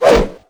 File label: clean hit; Pc Skill; FlyForInsanity